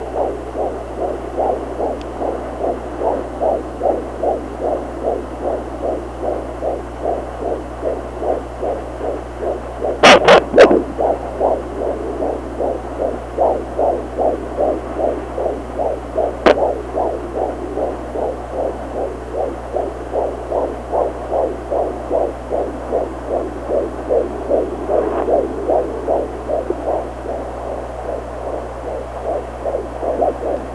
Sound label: heartbeat, newbaby